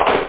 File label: hard, claps